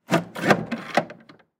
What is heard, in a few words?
Paper Towel Dispenser; Paper Towel Dispenser Manual Push Down; Kitchen; Blastwave